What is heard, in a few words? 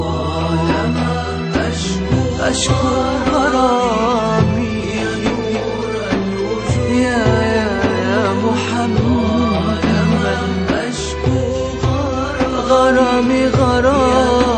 Madeeh Prophet